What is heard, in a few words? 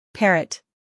parrot